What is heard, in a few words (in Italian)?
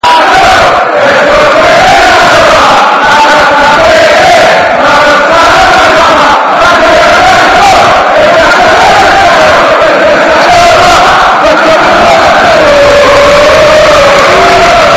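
tutti allo stadio; romajuve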